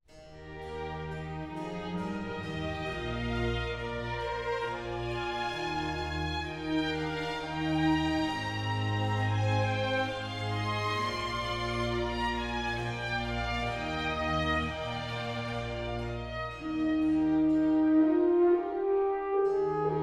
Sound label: Concerto in E Flat Major for Horn and String Orchestra - Adagio cantabile; Baroque.horn